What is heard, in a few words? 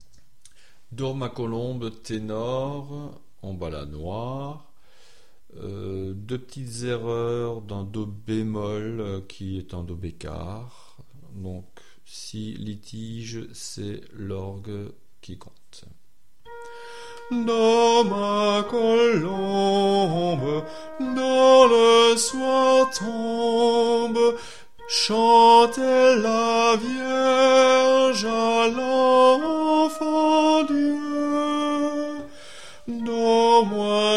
tenor; Dors ma colombe